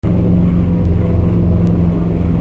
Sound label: Air Plane Overhead; Machines